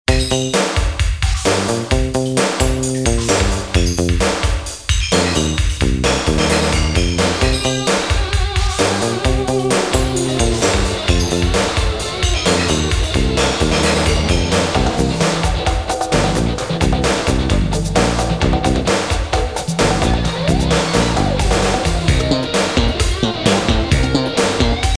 Percussion Discussion

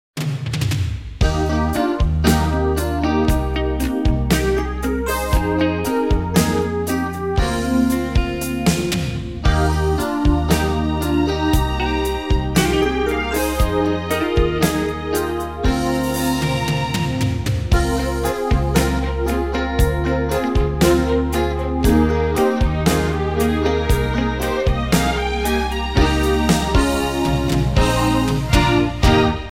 Strings Attached

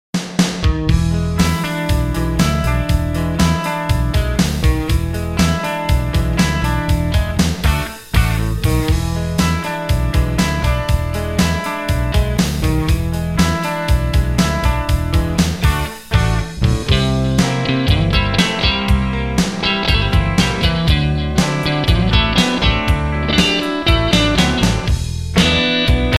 Swamp Rock

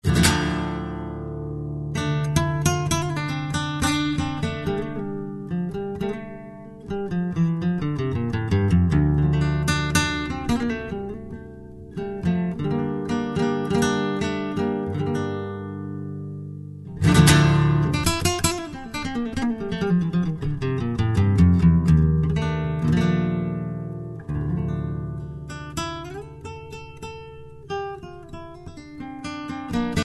Flamenco Holiday

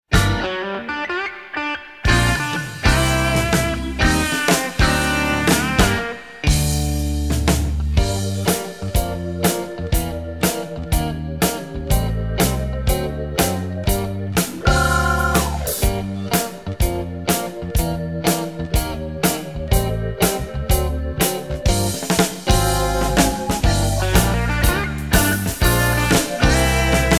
Gumshoe Blues

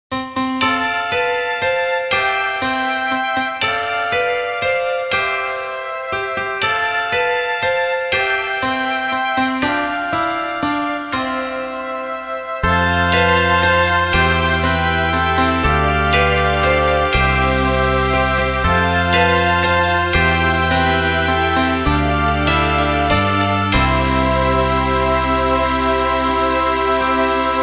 Christmas Waltz

